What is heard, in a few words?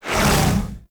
Slash Dash; Warframe; Excalibur Umbra